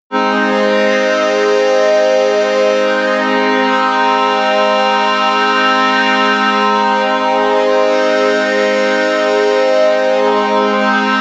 organ air; flaechen

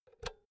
power cord insert hard; objekte; elektronik

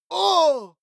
male combat hit soft; soundpool

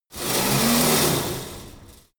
pullup machine cable pull fast; sport freizeit; fitnessstudio

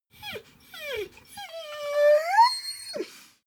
dogo argentino whine whimper; natur-tiere; hunde

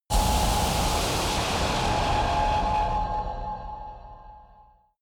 short air pressure equalisation; impacts

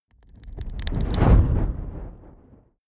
fire whoosh crackling soft; swooshes-swishes

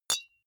deodorant hit, metal; maschinen; soundpool

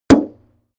metal impact bright muffled

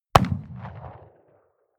revolver shot 44cal remington 25m rear

